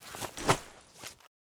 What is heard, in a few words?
knife kick